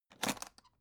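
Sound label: aluminium case small open short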